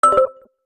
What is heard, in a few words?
scan